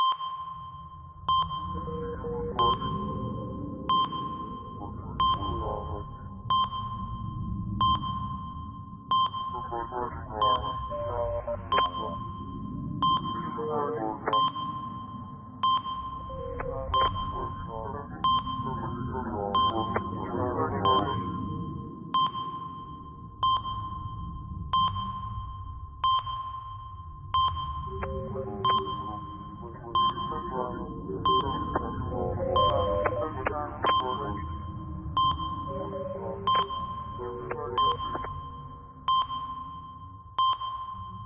menu; Five Nights at Ronald's

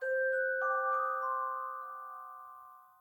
falling loop; roguebook

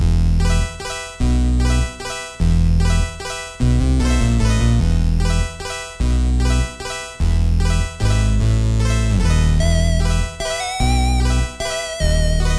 music graveyard loop; Skellboy

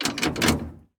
vehicle gearshift; teardown; ps5 windows xbox series xs

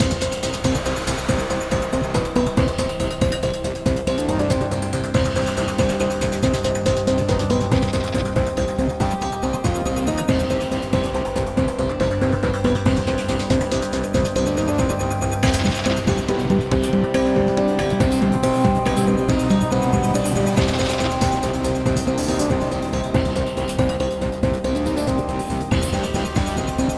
Pirate Turn

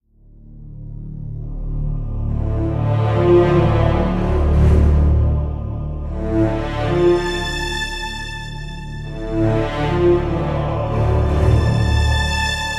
Jingle; SOCOM U.S. Navy SEALs Fireteam Bravo 2; PSP

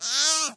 Llama Girl Scream

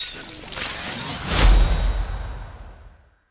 Reward; Forced Showdown

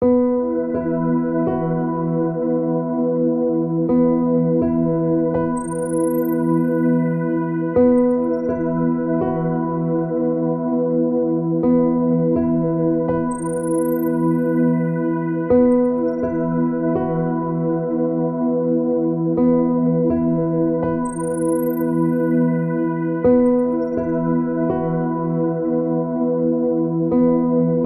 sad Music school Burning; WrestleQuest

